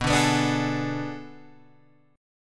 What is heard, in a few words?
B Minor 13th B down Guitar Open G